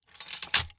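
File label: Reload Belt Movement; Valorant; odin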